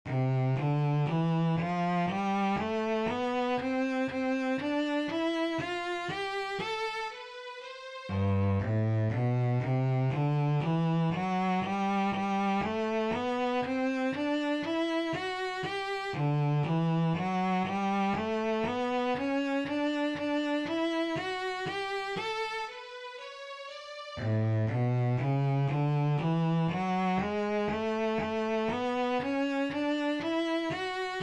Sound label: Two Octave Scales Bass